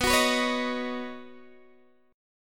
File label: B Major Add 9th B Guitar DADGAD; strummed; 21,21,21,18,18,x